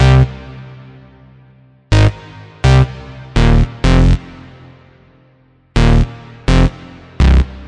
125 sparse hard synth; SYNTH AND ELECTRONIC LOOPS

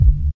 house Kick; STYLE KITS; Choosy House Kit

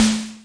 snare, Korg ER-1 drum machine

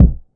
kick, classic hip hop

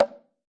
8 bongos bongo; ETHNIC and WORLD PERCUSSION; Eight Bongos

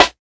Snares

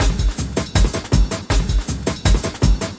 160 soft thick junga; 141 to 160 bpm

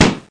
balloon pop; Weird and Interesting Hits

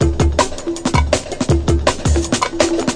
162 conga bright, drum loops and breaks; 161 to 180 bpm

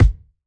bunch a kiks; Kicks

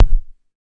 Yamaha TX-81Z BD; drum machines

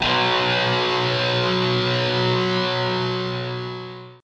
power chord

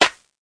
CL, Dirty Hip Hop Kit; drums full kits; style kits

